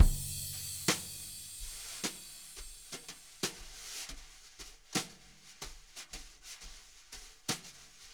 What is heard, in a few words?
jazz brush loops